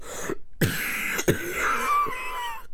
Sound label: Cough; 1916 der unbekannte krieg gamerip